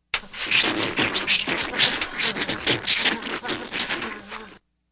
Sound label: weird fucking noise; Various Strange Sounds and Effects